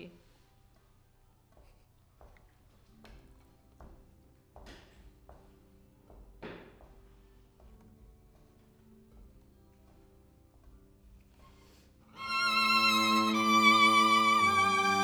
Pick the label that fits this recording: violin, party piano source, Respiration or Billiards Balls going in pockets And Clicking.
violin